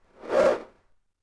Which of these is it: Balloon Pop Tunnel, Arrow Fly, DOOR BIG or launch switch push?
Arrow Fly